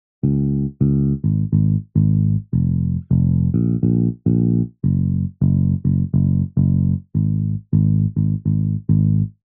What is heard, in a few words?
Bass